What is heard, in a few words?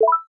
Tracking On; leopard iPhone